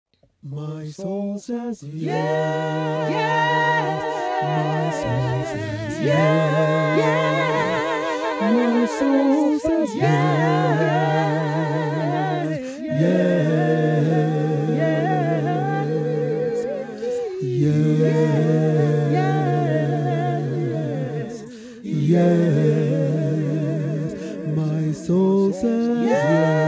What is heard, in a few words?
Gospel yes